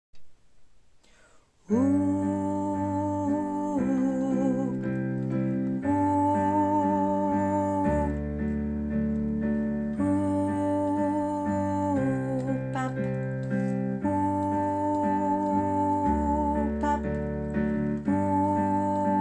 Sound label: alto